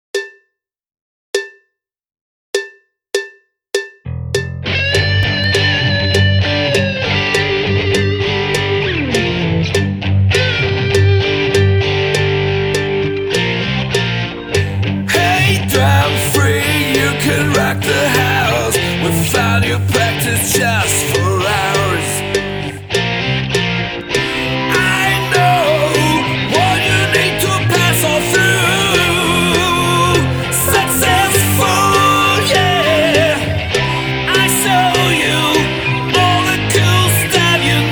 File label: Drumpart Playback 100 BPM